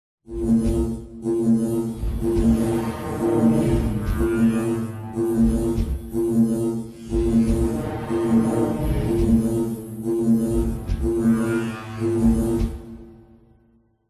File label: robotic power surges sci fi robotic robot cyber futuristic energy machine drone